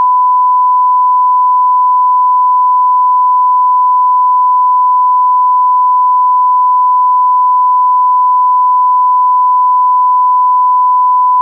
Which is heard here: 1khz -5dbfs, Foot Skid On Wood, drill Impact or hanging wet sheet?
1khz -5dbfs